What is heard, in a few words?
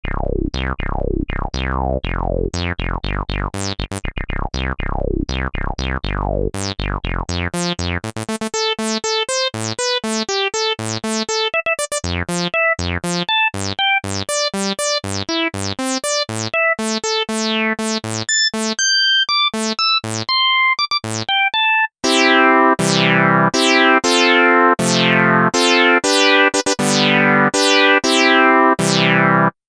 ensoniq fizmo filter saw rez sweep